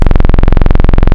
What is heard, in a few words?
D saw; Synthetic's Serum Collection; Echo Sound Works - Modular